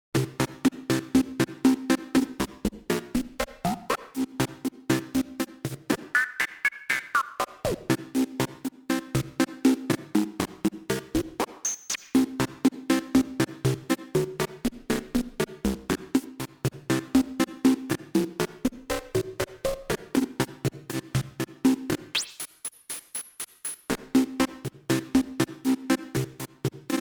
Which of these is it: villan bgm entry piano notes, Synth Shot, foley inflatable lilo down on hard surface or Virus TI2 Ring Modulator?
Virus TI2 Ring Modulator